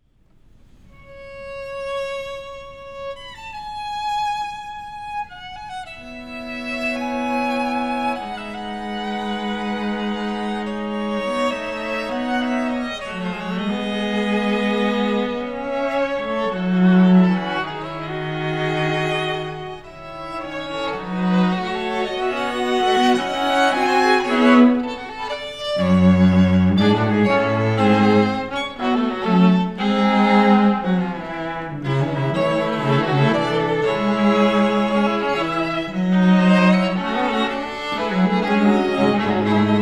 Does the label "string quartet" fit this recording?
Yes